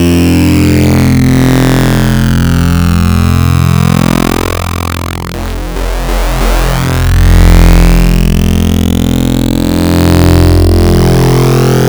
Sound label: Additive Mess II, Wavetables; Cymatics; Wavetables Collection Vol 3; Serum